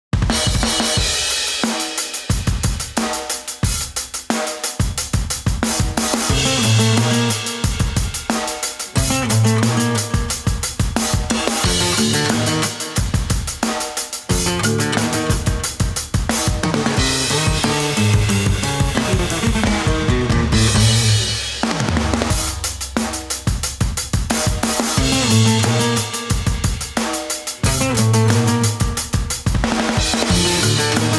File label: Underground Acoustic